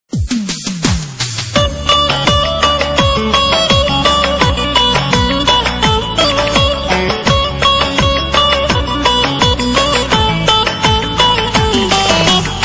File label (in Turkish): zil sesi; yerli